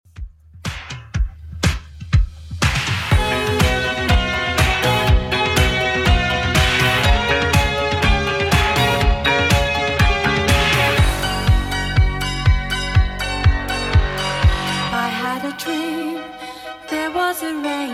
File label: 80s Redrum, 122 bpm